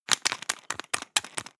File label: toothpaste screw cup open fast hard